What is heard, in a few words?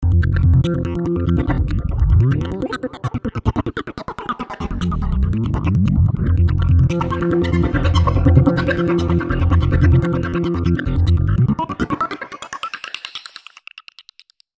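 2D arpeggio, V-Synth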